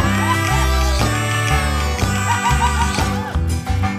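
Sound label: food world music inferno suspense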